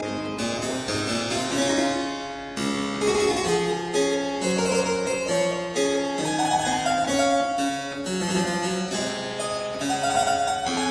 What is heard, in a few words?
Suite in D major Almain; Locke; Melothesia; Arthur Haas